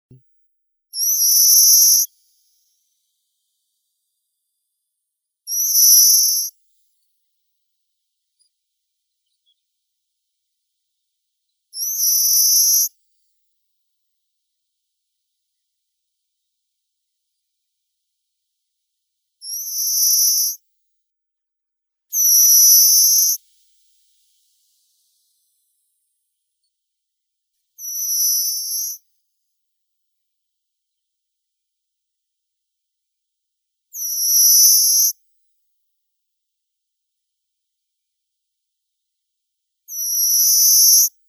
vorobei korotkopalii carpospiza brachydactyla

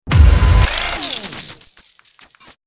hit complex short

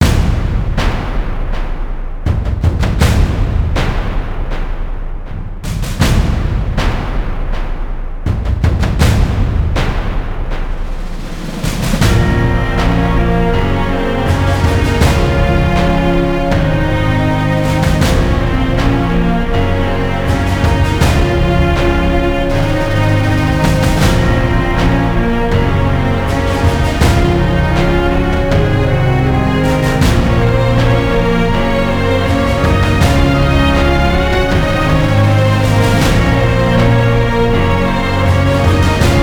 no choir

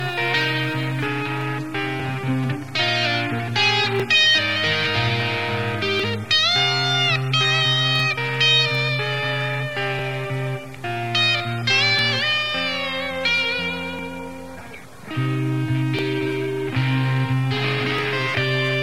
instrumental

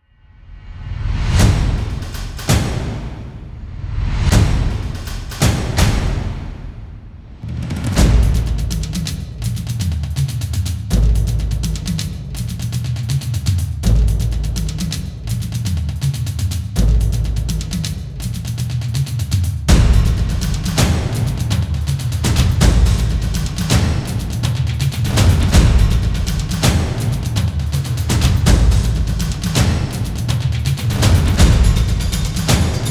drums

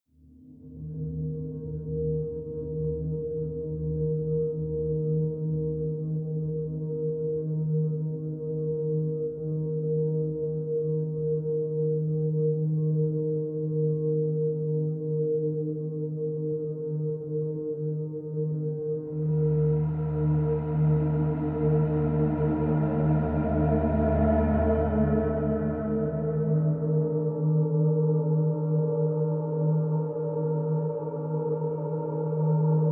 pads only

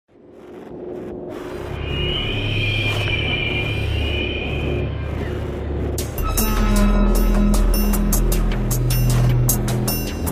ambience music

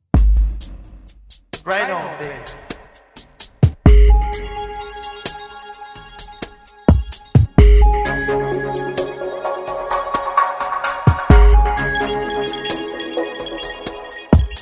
beach chiller mix

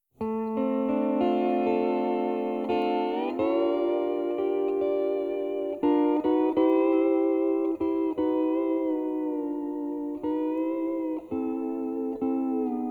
steel guitar

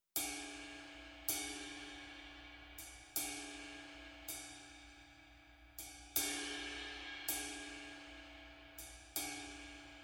perc only

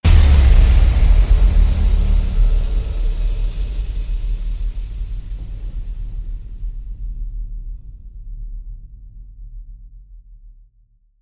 fireball

